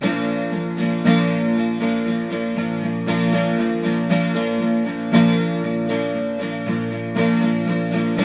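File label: 12 string major B, Guitars; Spectrasonics; Hans Zimmer Guitars VOL-1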